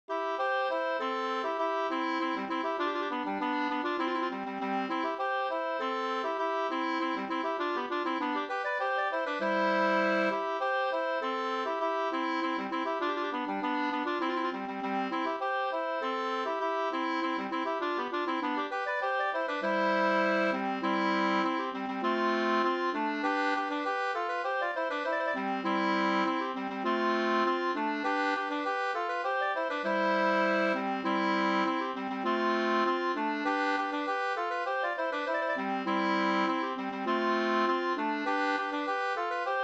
polka polkett; Kolar-Kristin fr Lovsta bruk 2st

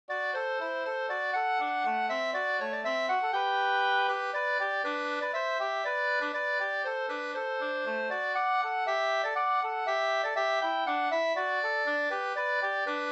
folkdans Tjeckisk polka Gdur; Sternpolka

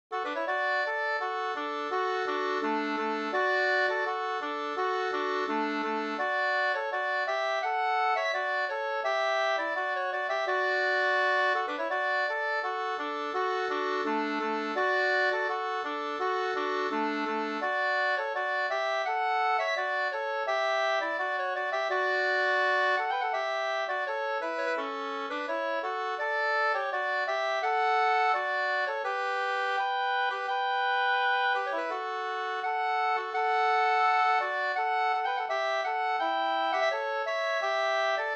brudmarsch; fr Selaon; Strangnas

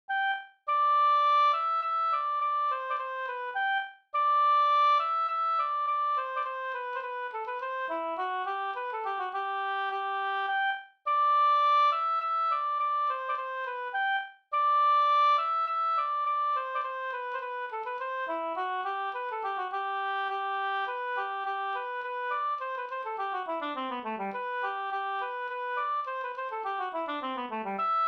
slangpolska

